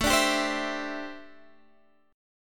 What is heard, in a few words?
D 7th Suspended 2nd Suspended 4th A